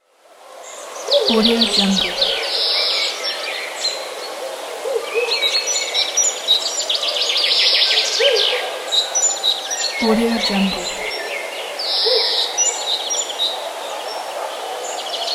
forest ambience near village

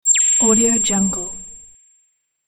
ear piercing tweet; marburymedia

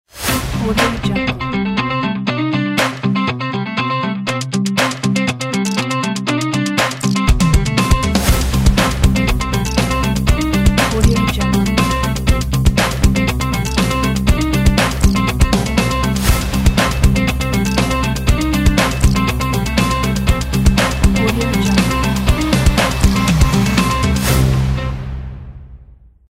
funky tv show logo

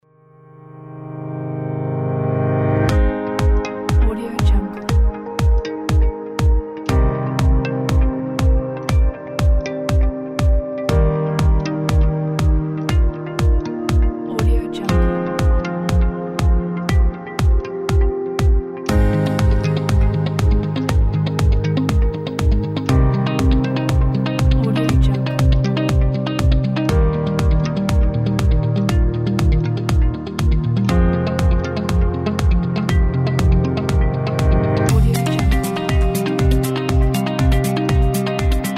corporate motion

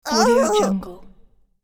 cartoon character death

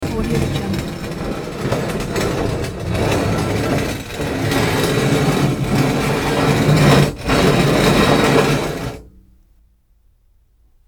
metal object scrapes on cement

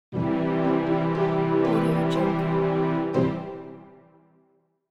cheerful orchestral strings transition

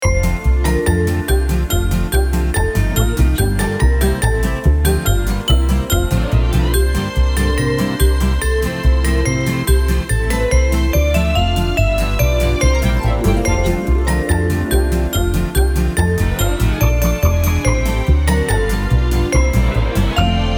retro deck the hall logo

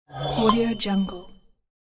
pop up

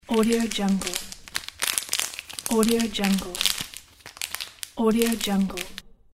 the package is crumpled

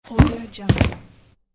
jumping

